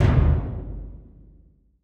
bass drum, Percussion; Sonatina Symphonic Orchestra

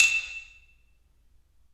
xylophone e5; Percussion; Sonatina Symphonic Orchestra

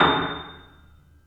piano piano a7; Sonatina Symphonic Orchestra

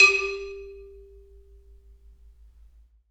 xylophone g2; Percussion; Sonatina Symphonic Orchestra

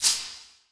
shaker b, Percussion; Sonatina Symphonic Orchestra

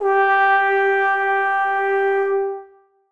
bass trombone g4; Sonatina Symphonic Orchestra

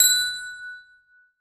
glockenspiel f#4; Percussion; Sonatina Symphonic Orchestra

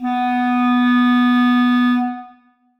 clarinet b3; Sonatina Symphonic Orchestra

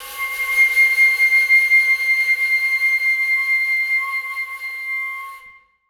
flute c6; Sonatina Symphonic Orchestra

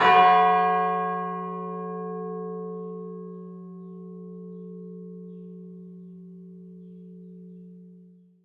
chimes f#3, Percussion; Sonatina Symphonic Orchestra

